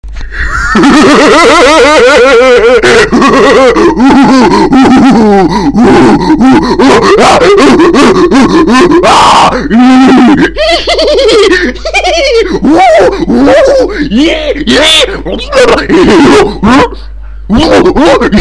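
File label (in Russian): идиотский смех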